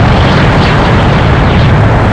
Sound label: fireball loop; cstrike; husk